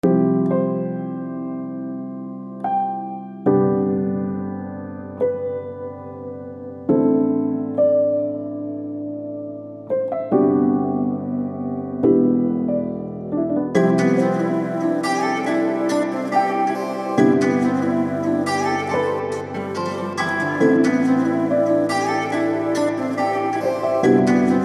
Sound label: Lofi take